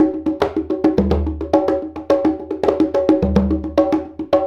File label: Congas; Essential Percussion